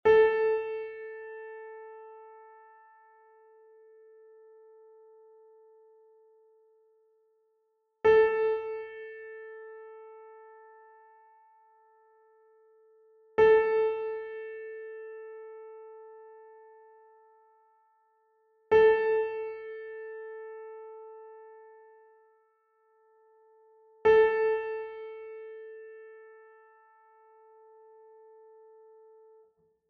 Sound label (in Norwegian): kammertonen 440hz piano